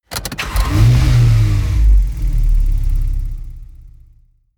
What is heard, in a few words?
car car start